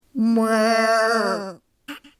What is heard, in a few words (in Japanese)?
羊の鳴き声